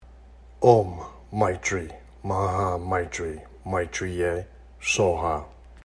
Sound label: Meitreya Buddha Mantra